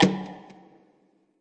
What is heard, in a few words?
urbantrap percussion verby hit; Urban Trap Kit; Hip Hop; STYLE KITS (Hip Hop, Etc)